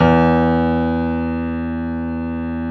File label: piano E1; AKAI S-Series CD-ROM Sound Library VOL-8